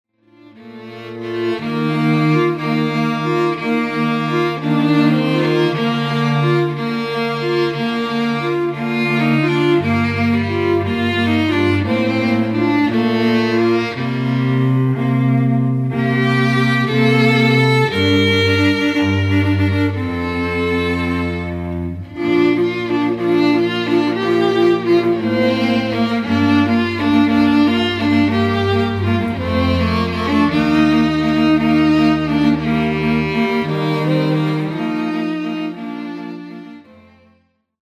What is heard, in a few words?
String Trio